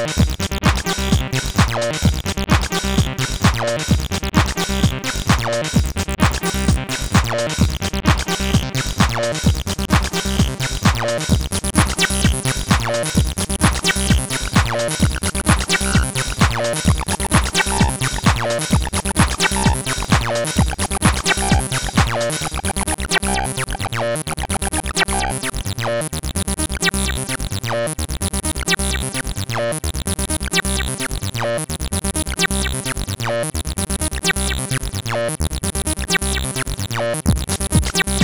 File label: Acid Machine